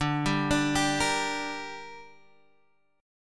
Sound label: D Suspended 4th Sharp 5th, Guitar, Drop D